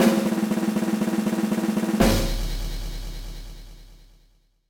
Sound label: DRUMROLL; Fairy Tail Gekitotsu! Kardia Daiseidou